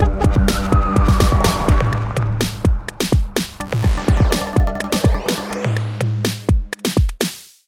Vinheta Vitoria Cidade; Food Boy

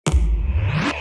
Sfx - Proximo Ao Portal; Neon Souls; PS4 PS5 switch windows xbox one xbox series xs

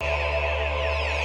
Sfx - Proximo Ao Portal (Loop); Neon Souls; PS4 PS5 switch windows xbox one xbox series xs

